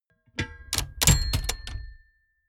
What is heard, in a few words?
mechanics medium rattle bell